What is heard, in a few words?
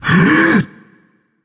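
non-verbal breath; smite; atlas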